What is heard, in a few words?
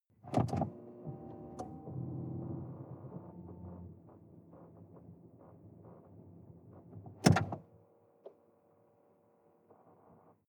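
sports car interior ignition on off; verkehr transport; autos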